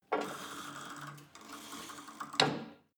cable machine old pull and drop soft, fitnessstudio